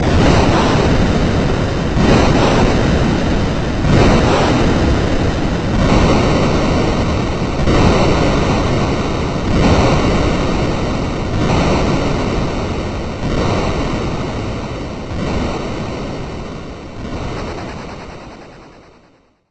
beach

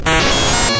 machine

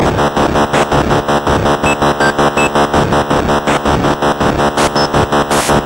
steady

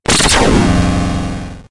squeal

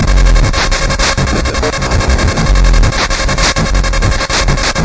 angry

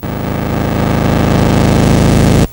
build

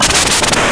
swippy

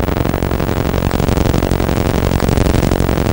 grind

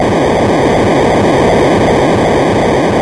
noise

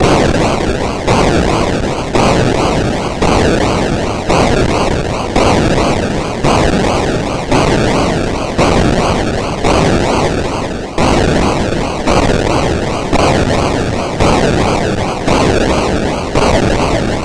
bangy drone

